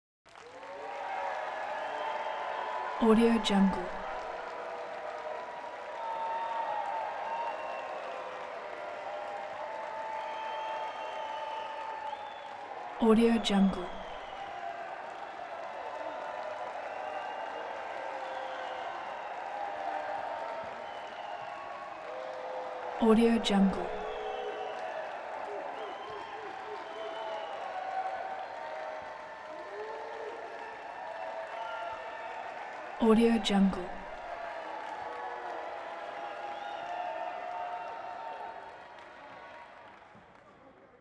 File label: fight crowd long swells of light applause